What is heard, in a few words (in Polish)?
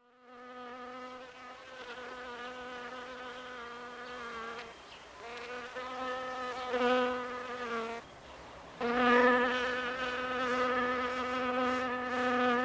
efekty zwierzeta wiosna